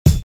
Kick

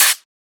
Open Hat; FLPRO

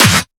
Snare Clap; FLPRO

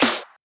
Shifted Clap; FLPRO

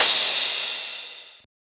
Crash

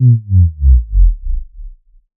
SubDrop Bold Gate

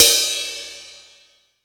Crash

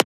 Transient; FLPRO

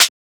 Closed Hat

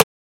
Transient; FLPRO

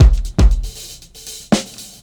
Loop

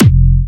Fatterr F4, Big Room Kicks

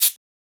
Shaker

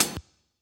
Hi Hat

